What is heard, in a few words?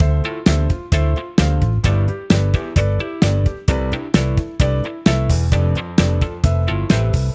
4 4 shuffle